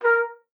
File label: trumpet a#4, Trumpet stacc piano